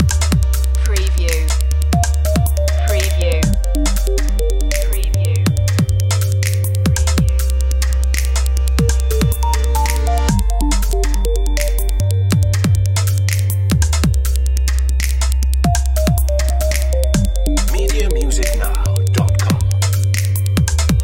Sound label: subsequent loop